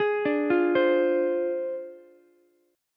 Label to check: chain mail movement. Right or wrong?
Wrong